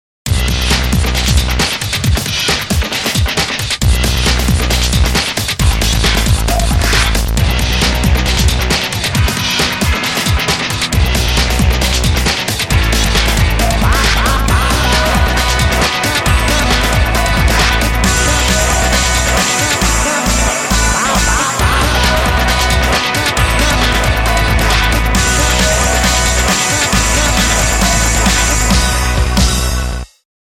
BIG BEAT

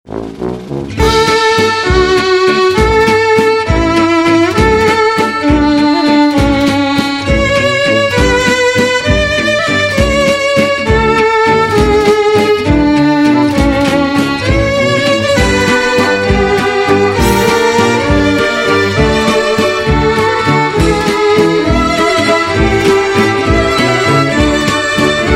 WALTZ; VICTORY

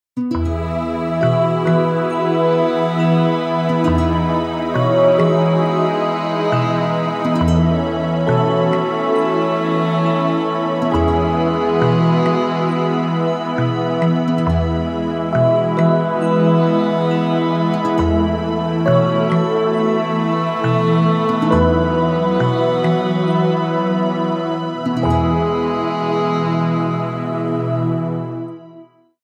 smooth groove

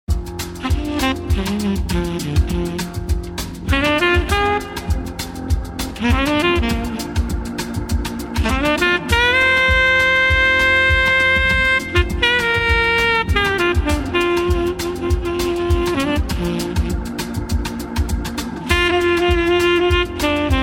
BLUES DELUXE

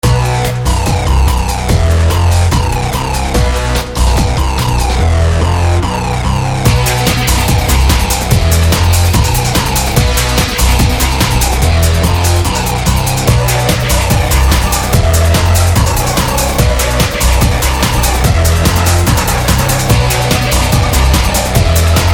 AGGRO BEAT

